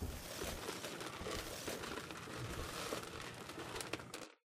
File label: filling pellets